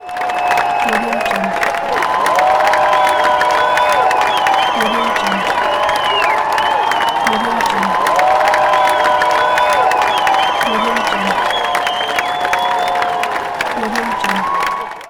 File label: ovation